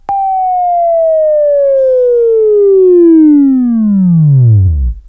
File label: Buzz; Labview Program; Sweeping Training program